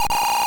skid; sonic

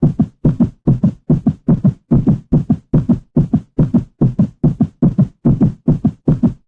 heartbeat fast; yttd

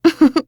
giggle

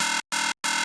zero input error

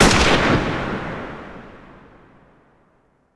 gunshot; bobby

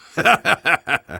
evil laughter; engineer

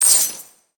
money sound; tressa

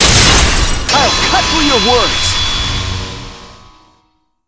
cross swords; shuichi saihara

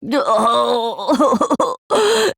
cry; jataro

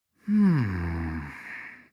hmmm; teruteru

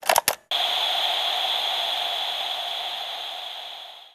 tape recorder

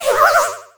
wisp call; sonic

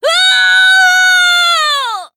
scream; yuta

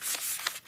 paper plane; sorin

